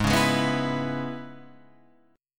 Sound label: Db Minor 7th Ab, chord